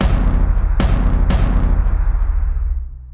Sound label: boom sfx; dandadan; dan dadan trailer